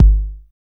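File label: 909 BOTTM kick, TR909 KIK; Drums In Your FACE!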